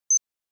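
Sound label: Flash Beep Electronic Beep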